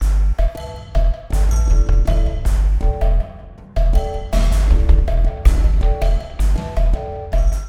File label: sounds music; Halo Combat Evolved; Xbox